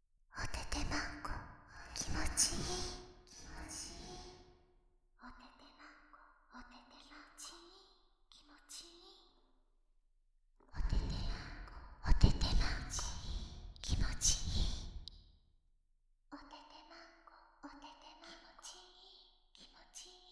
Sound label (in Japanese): 囁き&喘ぎ